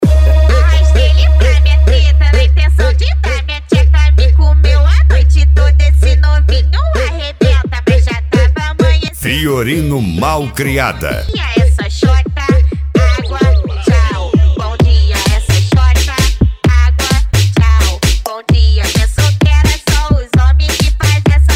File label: mega funk bass